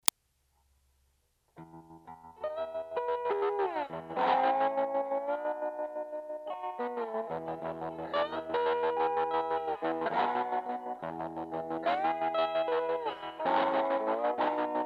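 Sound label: hodad fingers slide gain tremolo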